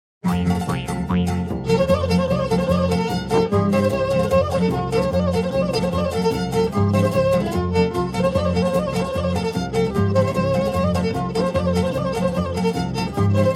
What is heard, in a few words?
polka; Janese polka